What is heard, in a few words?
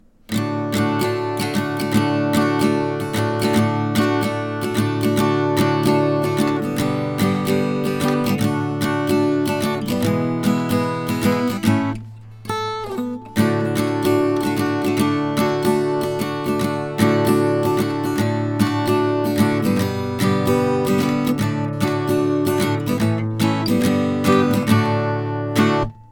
jingle bell